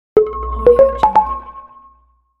short logo

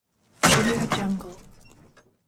car crash

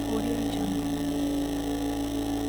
scifi mining small beam loop

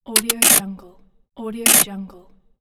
scotch tape

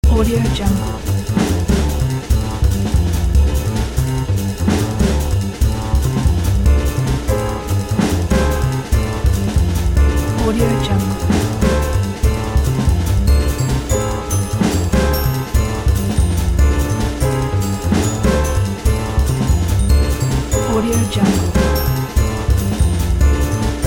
green swing

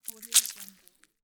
flesh bite tear; stormwaveaudio

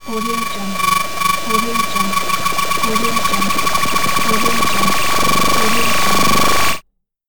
vibration 2d object

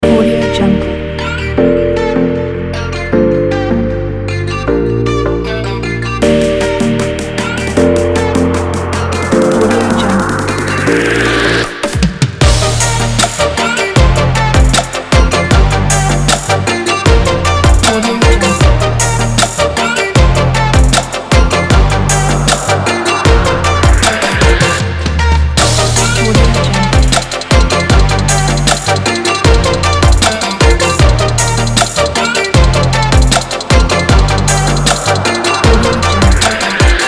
dynamic future bass; noahsound

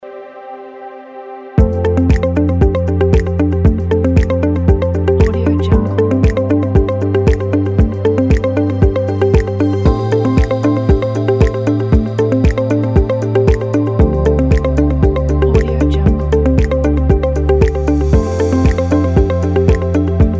fabulous tech

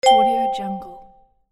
correct; robotsound

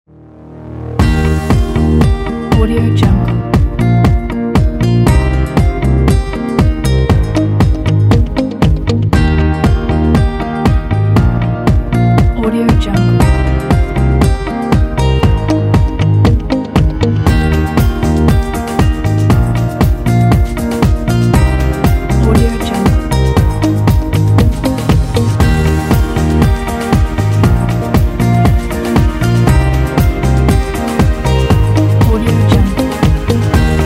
short inspirational

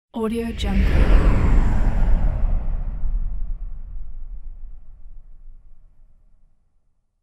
pass blow whoosh mid slow

